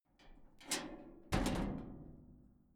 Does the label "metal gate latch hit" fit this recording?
Yes